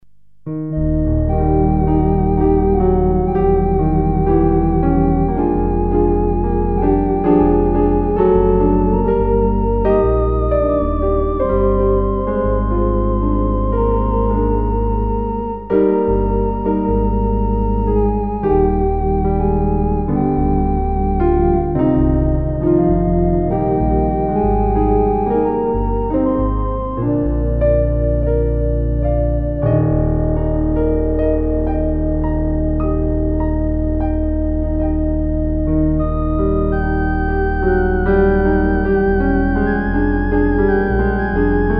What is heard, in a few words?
Piano Organ